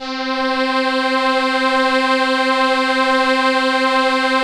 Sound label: ARP STR ENSL C3, First Call Vintage Keyboards